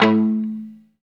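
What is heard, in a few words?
guitar chunk mute; 90's Sample CDs; Club-50 - Foundations Roland; Funk & Mute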